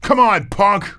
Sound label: fight; ragemap2025; fournines